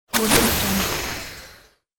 industrial glitch damage; futuristic

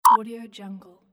advanced tech unavailable; game

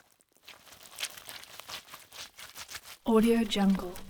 sawing meat; urban sounds

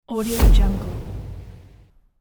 transition impact dark sweep hit; transitions and movement

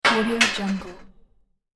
metal machine switch lever hit; industrial sounds

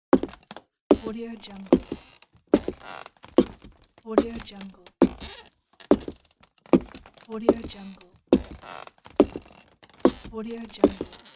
cowboy footsteps on creaky wood floor

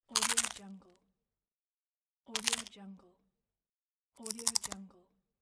wafer crunching; گاز زدن به ویفر; human sounds

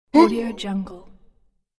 low male kill moan; ناله مرد بعد از چاقو; human sounds

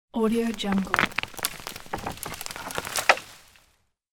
falling small rotten tree; افتادن درختچه پوسیده

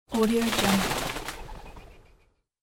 scared pigeon flying away from tree; فرار و پرواز باعجله کب; nature sounds

